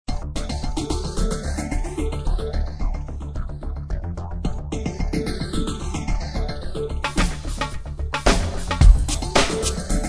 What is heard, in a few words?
music; Flashpoint; shockwave; driftnburn365